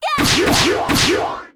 Sniper attack

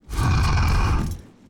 WAR WALK; HUMBABA; Mob

